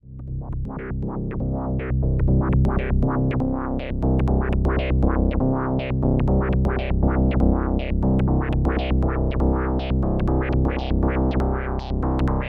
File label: Rossum Electro Music Morpheus All Pole Dist